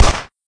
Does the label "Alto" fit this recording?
No